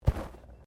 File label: Body movement Slide